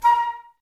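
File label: flute, Woodwinds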